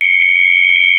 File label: airplane attention sound